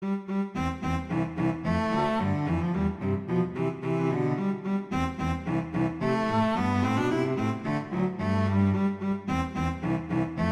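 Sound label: Duet, Rondo Allegretto, viola; Mazas; Duets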